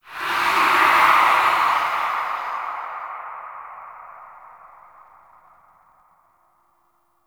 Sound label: Ranger Stalk Steal A; Warframe